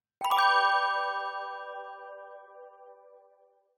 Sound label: power up magic